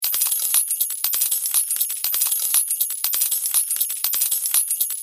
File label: coin dropping